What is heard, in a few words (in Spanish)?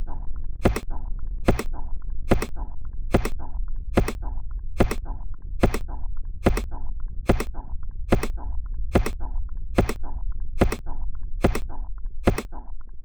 latido terrestre zeppelin